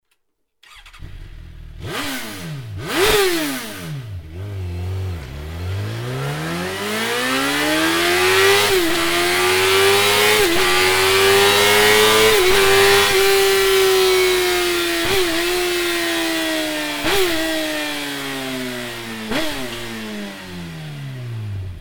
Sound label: racing exhaust system suzuki gsxr1000; akrapovic